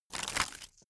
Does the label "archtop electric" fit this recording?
No